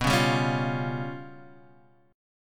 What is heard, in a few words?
G 7th Flat 5th